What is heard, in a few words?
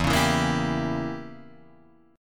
E Diminished 7th E, Guitar Drop D